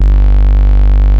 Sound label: JP LEAD; Pro-Rec - Synthsonic; JV ANALOG2